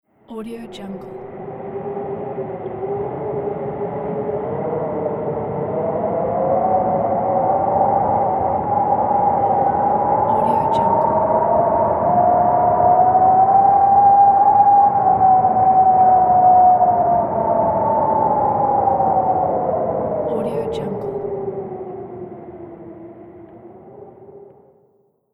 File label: winter strong ghost wind